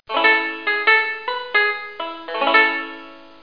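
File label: banjo sound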